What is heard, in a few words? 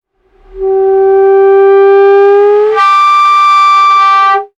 sound design air pipe pressure, Pressurized Air Release; Pressurized Air Or Steam Release From Pipe; Hisses; Sound Design-Pressurized Air Release; Objects; McKinney Sound